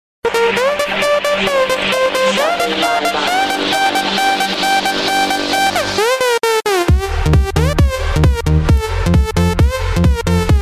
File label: hot ringtone